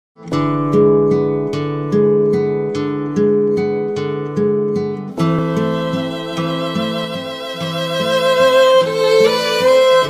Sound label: Rabindra Sangeet ringtone old music tone love music